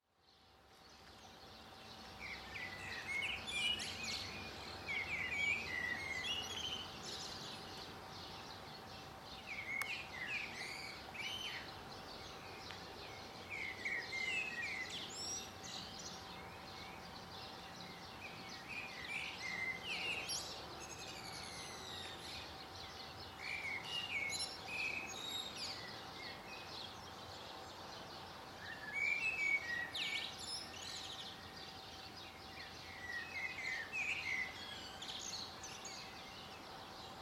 Surround residential morning birds Stereo Downmix, Birds singing; Birds singing cheerfully in all directions on a residential street